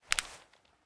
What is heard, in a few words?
Flash Light Take; Game rip; MiSide